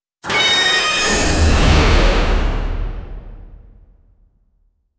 a futuristic scifi door

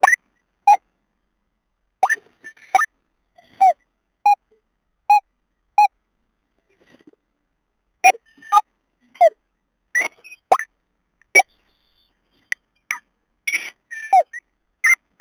koi fish drops

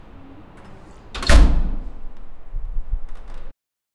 door closing ambient city; ambient noise

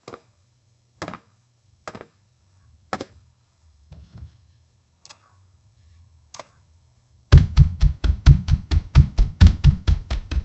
mechanical robot feet sto, walking

